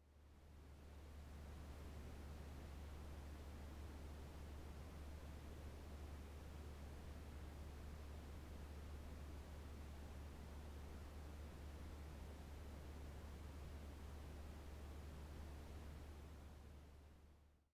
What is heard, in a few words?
vault ambient; archive-world